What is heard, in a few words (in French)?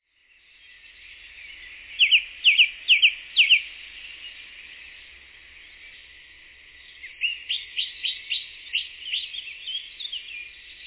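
grive musicienne, chants d'oiseaux; enregistrés par Jacques Prévost de la LPO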